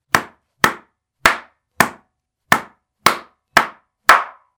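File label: slipper sound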